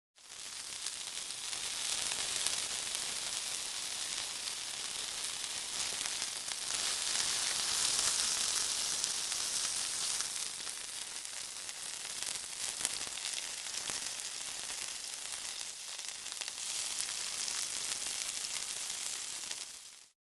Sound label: pressing down sizzling burger; sonniss gdc